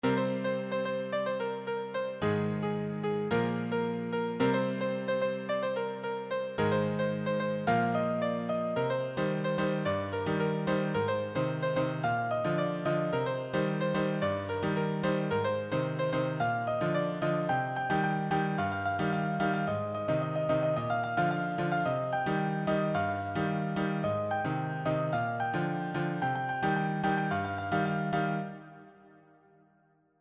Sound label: solo, piano